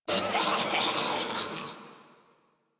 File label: Infested Runner Attack Call; Warframe; Runners